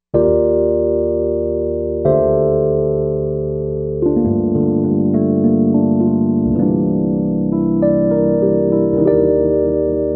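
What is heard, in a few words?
Basic electric piano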